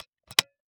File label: Technology Electronic RCA CD Player Closing